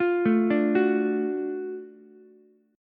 F sharp Minor Major 7th Sharp 5th F sharp down Ukulele; arpeggiated